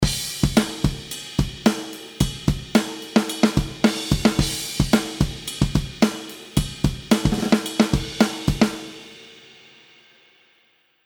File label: Drums; DBX 160A; VC160